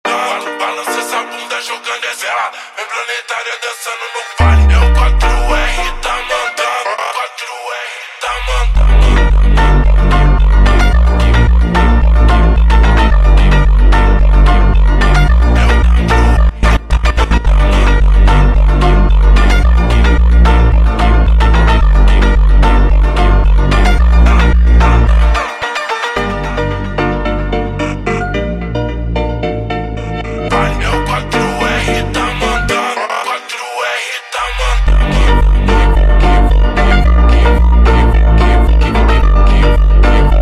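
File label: sped Up, phonk music; funk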